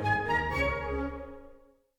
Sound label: Neutral End 60bpm; Music Dialogue